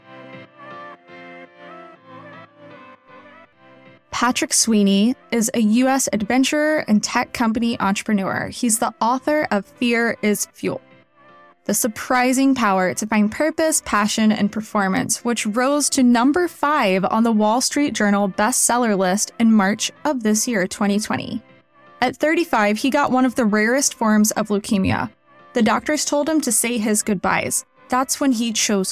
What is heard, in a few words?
Intro plus Music